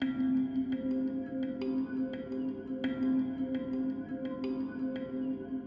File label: Synth, Frozen Glass; BB 2HTC Samples Vol 4